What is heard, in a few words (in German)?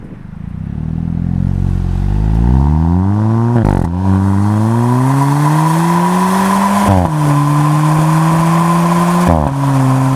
sportage abbiegen 0-100; bastuck